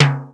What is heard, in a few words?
lo timbal